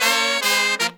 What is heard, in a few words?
Pop & Funk Brass, 110; Best Service ProSamples vol.25; AKAI